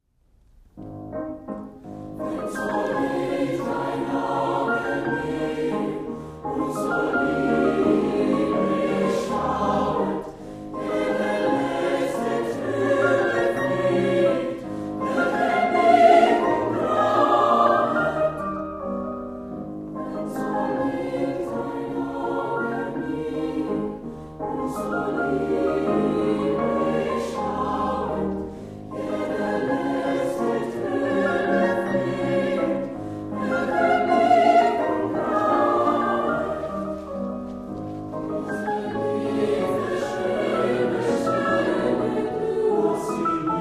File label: college chorus sings brahms; Swarthmore College Chorus & Garnet Singers